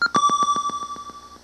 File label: Sea Battle Ping; Sea Battle 1